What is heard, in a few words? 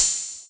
Quasimidi 309, Drums